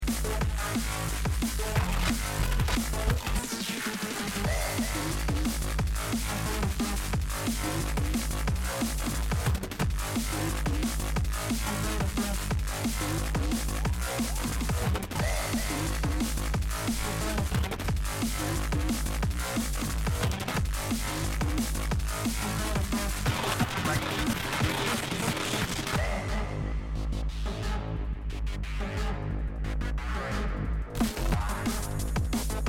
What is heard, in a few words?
BASS WEEDY DRUM